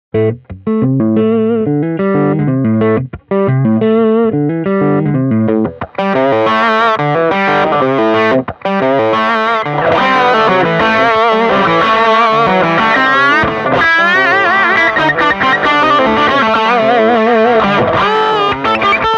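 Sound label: rhythm lead, pedals; gboy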